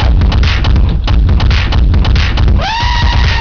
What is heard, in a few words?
elephant